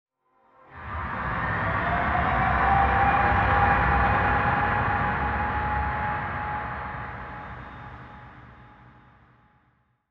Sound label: Pad; Dubstep